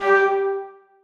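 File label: flutes staccato f#3; Sonatina Symphonic Orchestra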